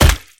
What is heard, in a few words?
hammer hit